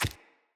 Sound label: Pack Wolf Step Single; Animals; Footsteps